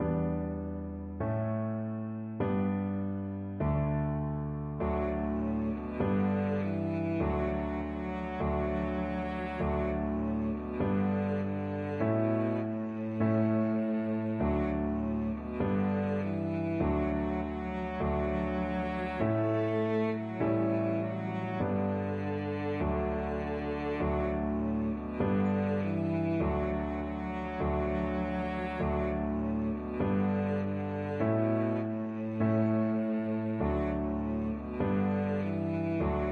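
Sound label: violoncello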